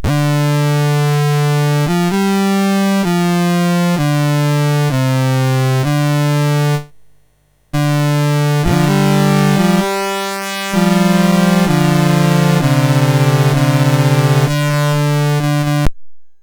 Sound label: Heavy Metal Guitar Licks, MOS6581R3